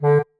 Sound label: marimba penguin; ゆめ2っき